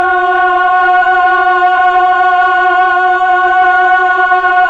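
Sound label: voice Mellotron choir, slow choir; Roland LCDP09 Keys of the 60s and 70s 1; Melotron Vox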